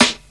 airy snare g key

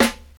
classic trebly steel snare drum sample d key

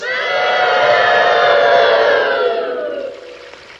spectators booing

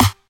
juicy snare sample g key; snare drum samples

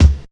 studio kickdrum c sharp key

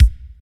rich mids bass drum one shot b key; 90s

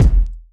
kick drum g sharp key

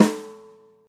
long room reverb snare one shot g sharp key; snare drum samples

